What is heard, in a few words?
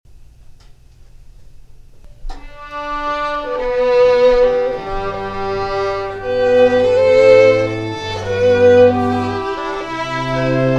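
Reel as AIRE, Scottish Fiddling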